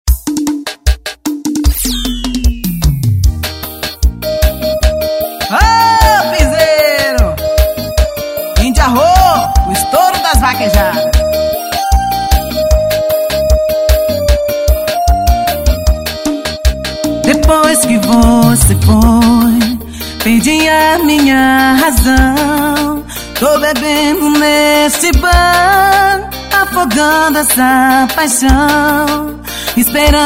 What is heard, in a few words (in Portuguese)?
piseiro